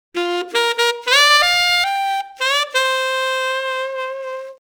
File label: sax